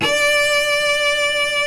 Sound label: SOLO CELLO